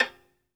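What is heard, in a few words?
solo violin, strings; ILIO; Synclavier Strings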